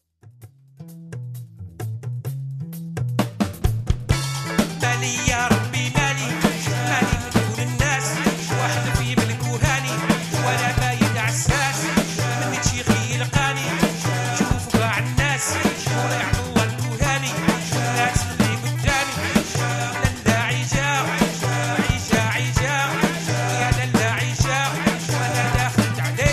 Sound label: Gnawa Groove